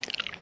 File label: Wet Meat